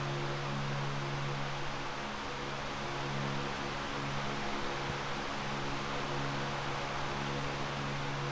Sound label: big fan loop; incinerator